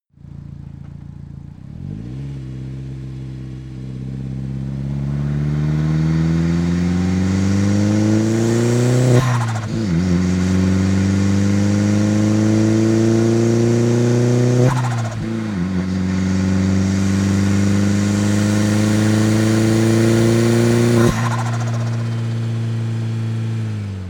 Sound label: car donkervoort d8 gto accelerate gear shift